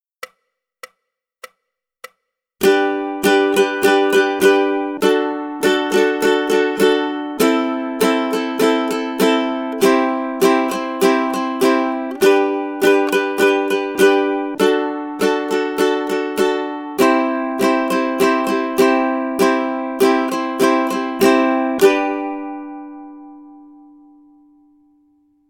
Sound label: rhythm study, ukulele; grade1